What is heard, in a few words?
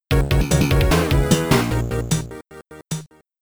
Snowy Peaks Jingle